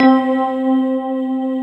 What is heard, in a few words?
SYN K-2000, Roland